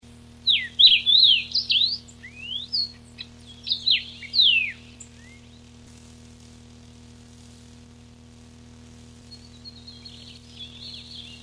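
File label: Carpodacus erythrinus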